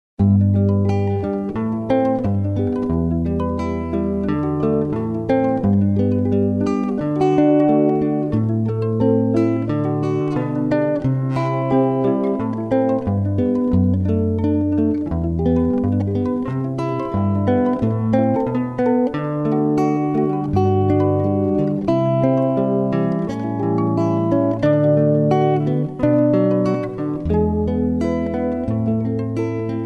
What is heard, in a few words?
damped rattle